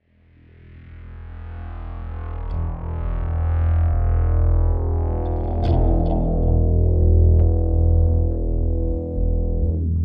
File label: Fanfare